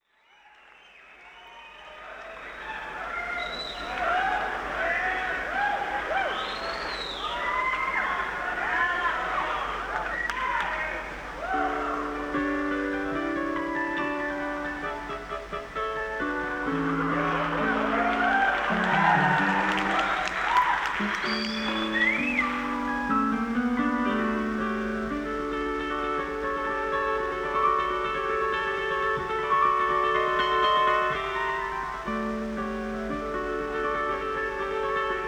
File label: Piano Solo